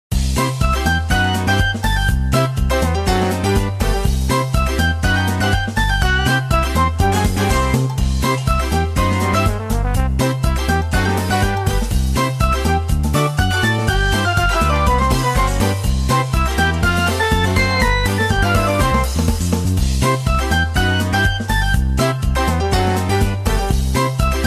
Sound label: Minigames at Dawn